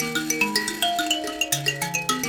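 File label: grand mbira phrase, African; Roland LCDP11 Africa VOL-1; M'biras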